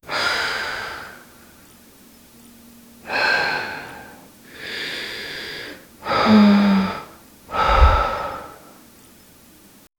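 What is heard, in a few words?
a heartfelt and satisfying, grateful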